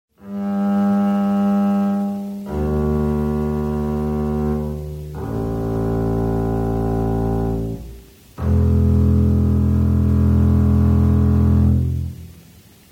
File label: double bass